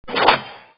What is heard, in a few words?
boot open